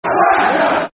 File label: Wanker, Football Chants; West Bromwich Albion Wba; fanchants